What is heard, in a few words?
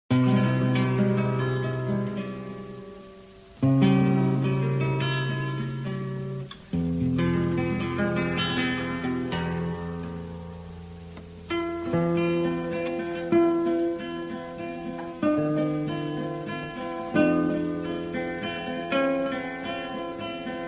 Guitar